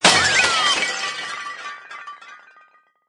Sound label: Cartoon Big Crash, Slip fall accident, Comedy junk crash; Funny Sounds